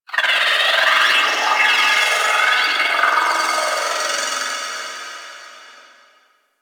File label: Orphix Shield Pre Disable One Off; Warframe; The New War; Sentient Devourer